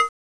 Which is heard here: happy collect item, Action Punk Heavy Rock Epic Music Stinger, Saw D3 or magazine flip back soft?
Saw D3